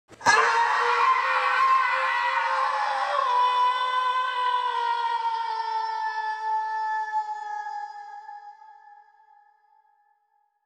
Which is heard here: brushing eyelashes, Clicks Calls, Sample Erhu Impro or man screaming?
man screaming